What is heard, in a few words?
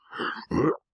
Hurt; Amanita